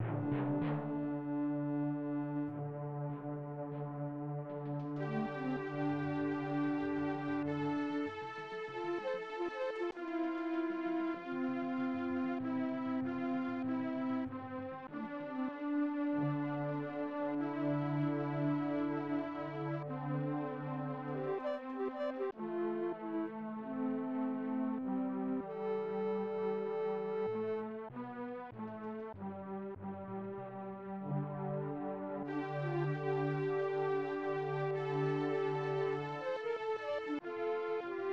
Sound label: Level Music; Spectre; SNES